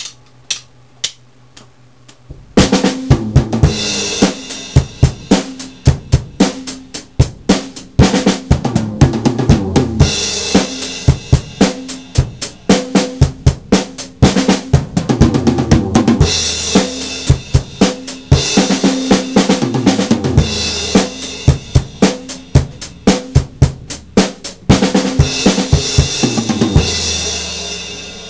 mitchell solo, drums

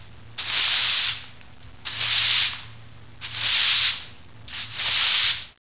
dirt; casa; objetos

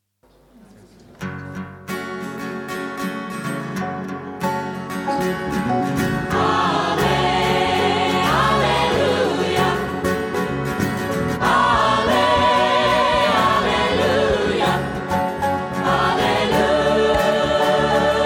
alleluia, folk group

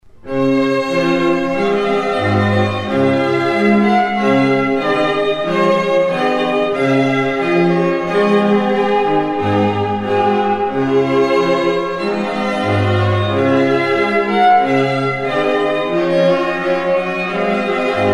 Trumpet Voluntary

